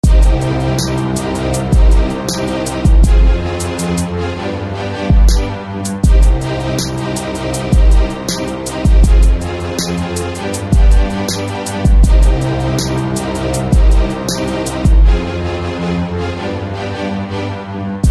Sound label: Strings; Sienna; SFB FX